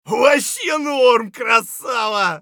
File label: scav cheer; eft